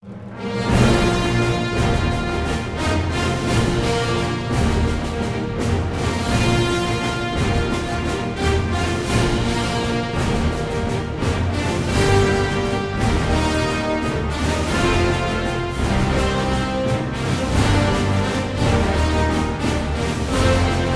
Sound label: Cinematic Orchestral Heroic Army Music